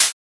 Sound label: ui swipe